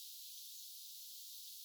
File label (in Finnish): onko tuo jokin rastas paikanpaalla ilm tasta aanesta tuli mieleen taviokuurnan aani